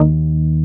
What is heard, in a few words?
SHAKY D#2, BASSES